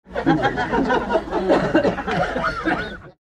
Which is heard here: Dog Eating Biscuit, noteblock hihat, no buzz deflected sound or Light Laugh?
Light Laugh